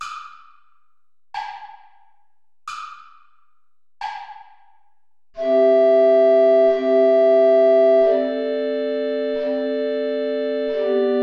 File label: play along without soprano recorder; mozart wolfgang amadeus; ave verum corpus